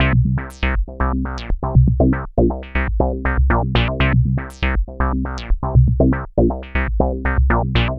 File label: Bassline, Prototechno